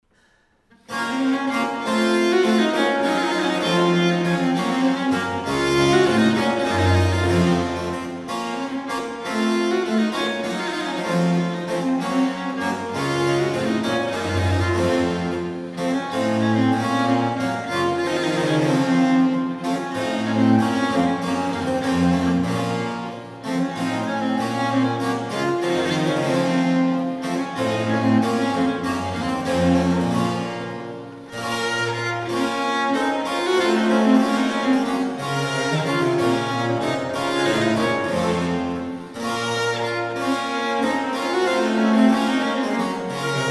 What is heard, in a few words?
Suite En Si Mineur; IIe Livre